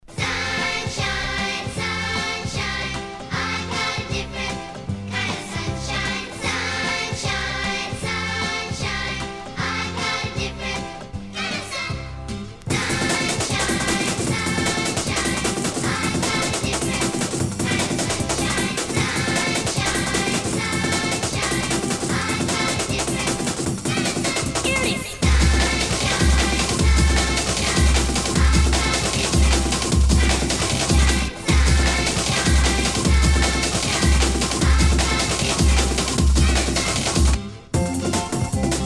beatbreaks classics

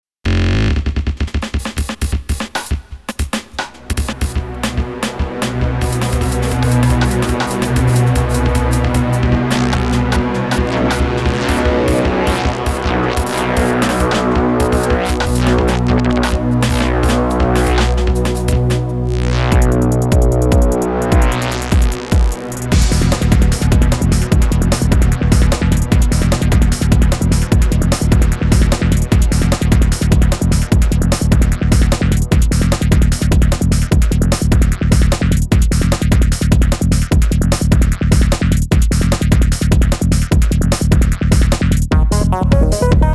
goa psytrance

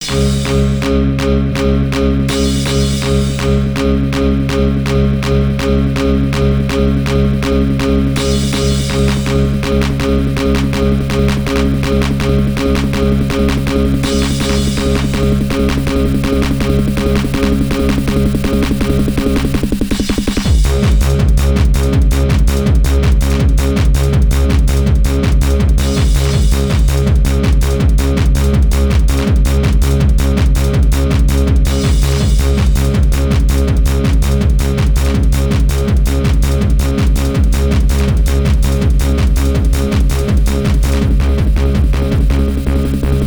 Chorus Reverse; progressive; Live At Mayor Raves

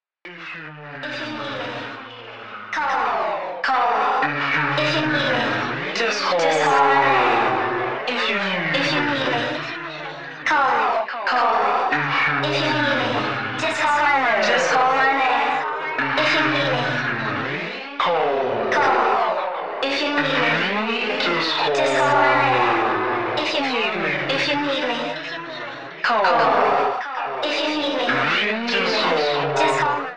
Vocals FX; techhouse

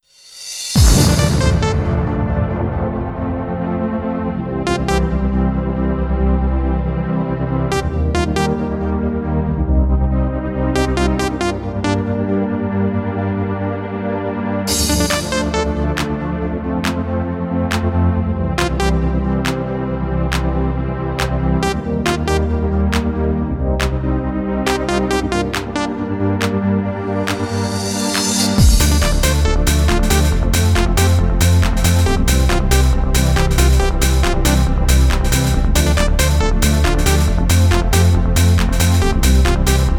Trance

